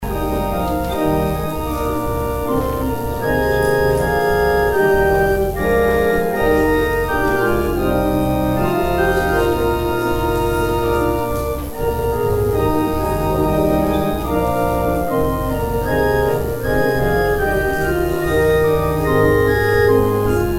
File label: organ interlude; Offering interlude